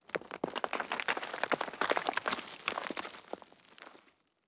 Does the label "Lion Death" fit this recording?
No